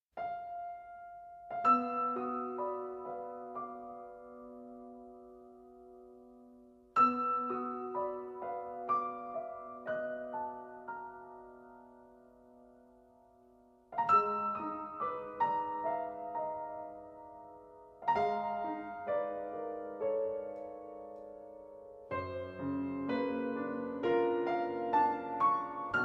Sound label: Piano Prelude; Piano Prelude 11